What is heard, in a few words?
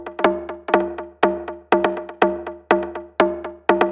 122 BPM Tubos; mmb studio melodic